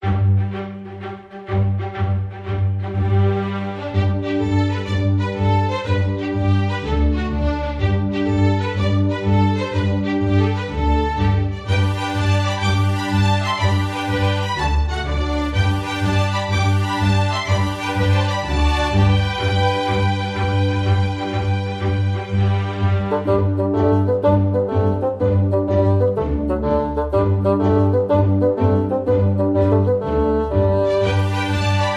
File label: I Saw Three Ships; Trad (PD)